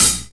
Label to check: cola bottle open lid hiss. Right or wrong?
Wrong